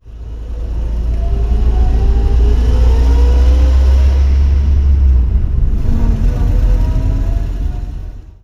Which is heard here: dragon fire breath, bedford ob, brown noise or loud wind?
bedford ob